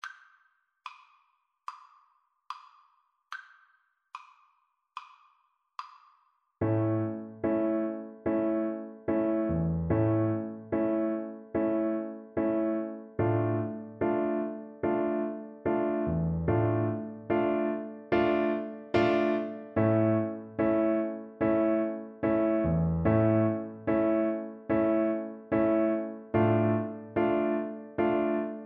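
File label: viola karaoke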